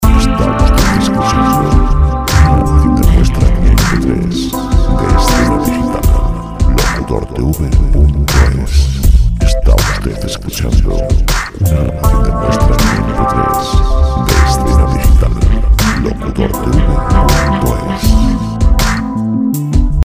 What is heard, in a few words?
chill, hold music